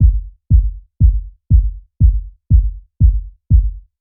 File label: Major Kit